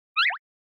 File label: Motion Pop